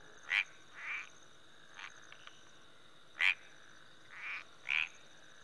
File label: frog; t2-mapper